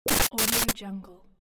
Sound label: breakdown